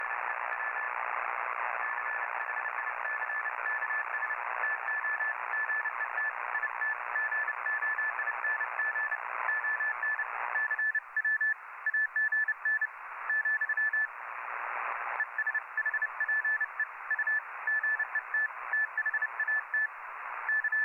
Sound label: intermittent noise 7058 ssb; very little snow fall; signalink; dealing with local rfi